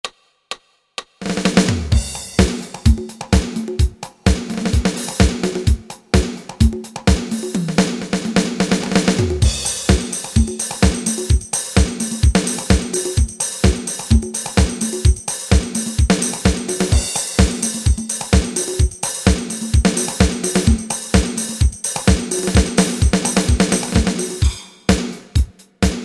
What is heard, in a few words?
dance 8 beat